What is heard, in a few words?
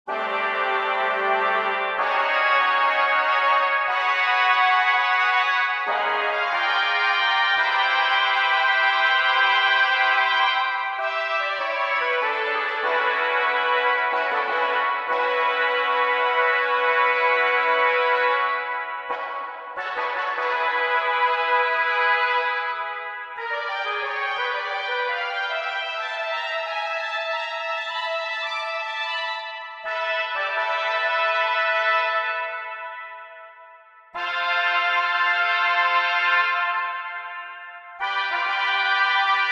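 New Year Fanfare, Organteq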